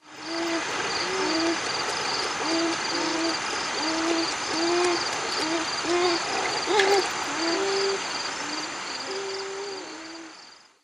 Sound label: Beaver